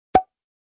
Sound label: Water bottle